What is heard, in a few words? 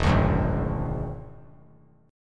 E 9th E down; E9 strummed; JGuitar